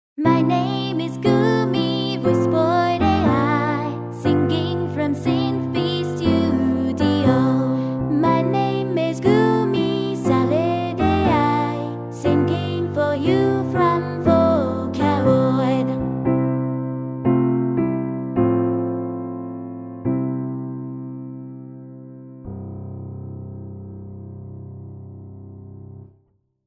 synth vs vocaloid; Gumi

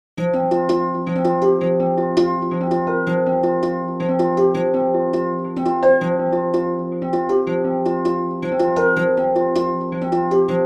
Hang

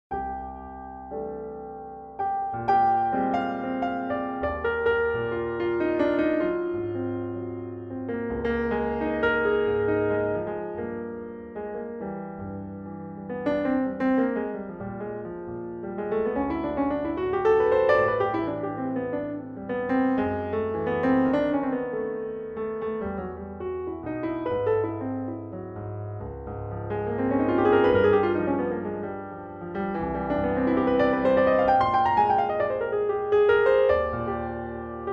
Singing Steinway

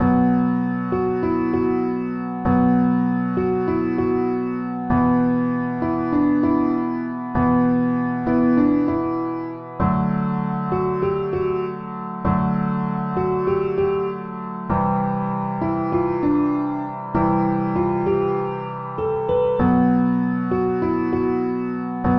piano; Psy-T; Pianoteq

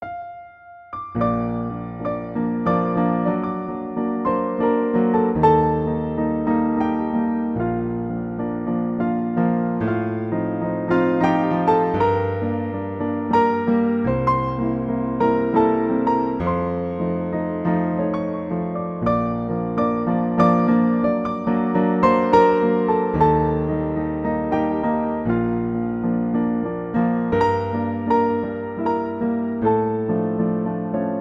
Blumenlied (Bluethner Dulcet Hall)

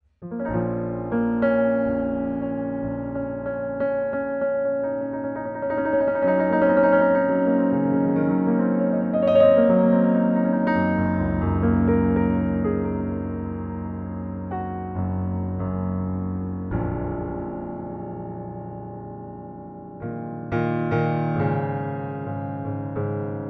with hammers, Pianoteq; Modartt

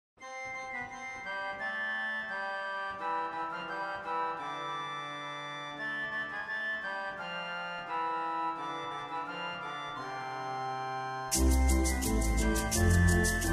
organ reeds

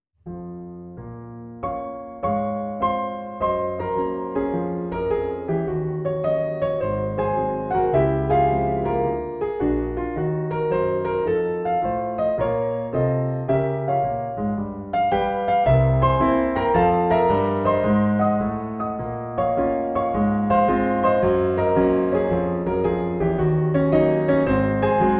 Glassy ambience

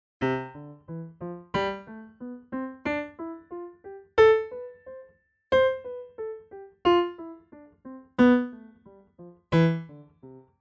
scale test, piano

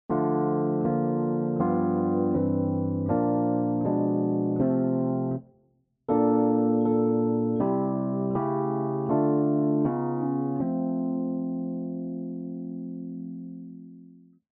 Minor Feel

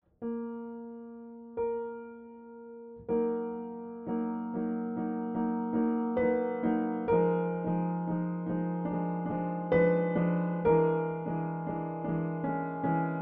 Pleyel

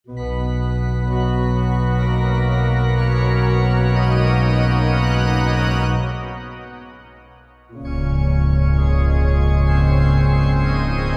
Chordganteq, chordexperiment with Organteq

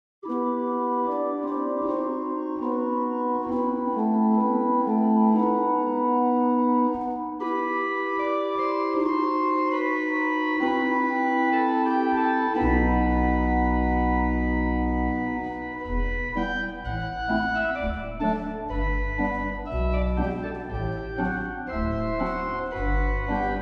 OrganTeq Dynamic

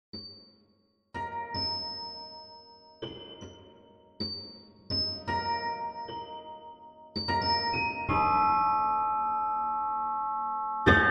xgrand

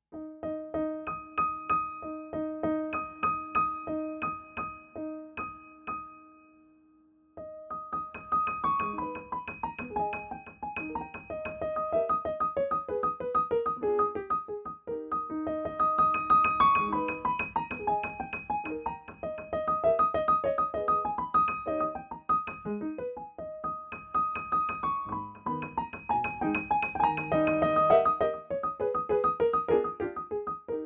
Steinway D NY Concert Grand; La Campanella; HB

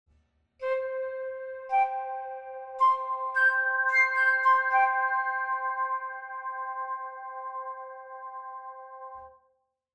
Flute FX; K2Basic

